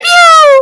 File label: pewww